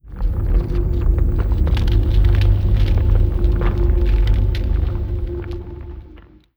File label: space crystals; Stellaris; nemesis